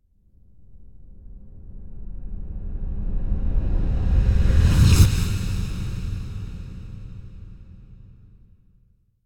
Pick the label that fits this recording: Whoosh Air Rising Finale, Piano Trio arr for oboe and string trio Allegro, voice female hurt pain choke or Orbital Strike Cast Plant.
Whoosh Air Rising Finale